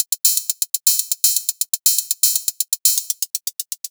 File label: Hat